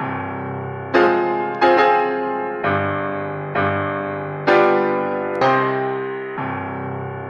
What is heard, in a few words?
piano, Loops Sad